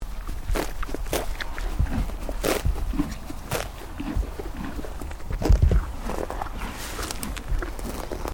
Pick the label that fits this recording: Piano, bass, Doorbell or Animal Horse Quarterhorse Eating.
Animal Horse Quarterhorse Eating